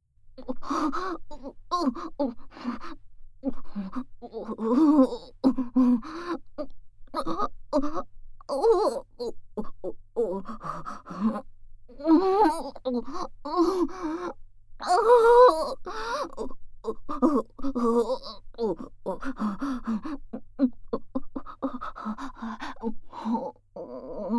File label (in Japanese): MAX発情オホ声獣セックスループトラック, ASMR; 異世界現地妻なダークエルフさんとの濃密おまんこ交流